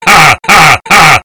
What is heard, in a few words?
hahaha